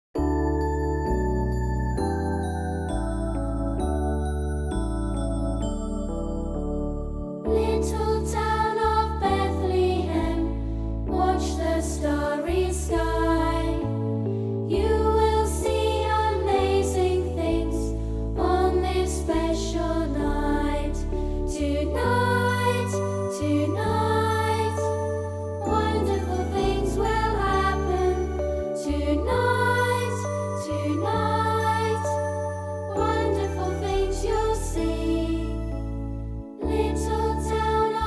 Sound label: choir